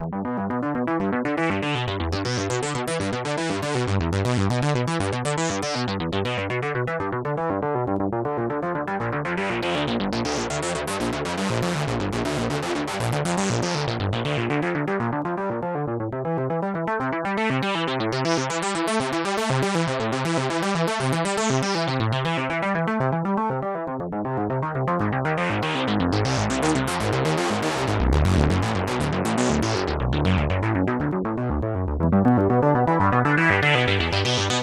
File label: distortion; fathom